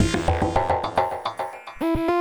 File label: NRG Arp, trance